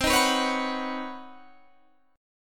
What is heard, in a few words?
B Major Add 9th; strummed; Badd9/C; JGuitar